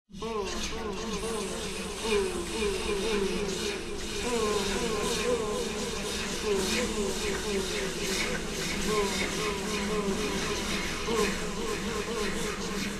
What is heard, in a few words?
scream; Human Machine; RSVP VOICES; Audio Network